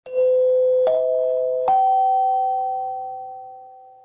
three chimes ascending

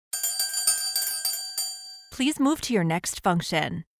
bell please move to your next function

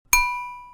short ding

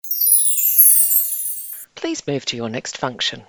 magic chimes please move to your next function

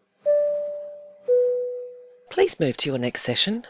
airplane ding please move to your next session

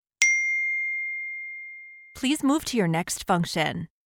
single chime please move to your next function

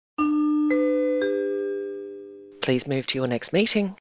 chimes medium please move to your next meeting; nbc chimes medium

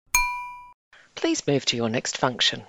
short ding please move to your next function

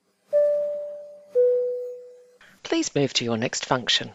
airplane chime please move to your next function

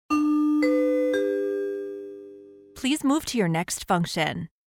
chimes medium please move to your next function; nbc chimes medium

